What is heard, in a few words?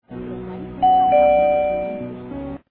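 ding one tone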